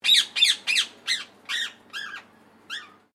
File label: Bird Parrot Indian Ringneck Call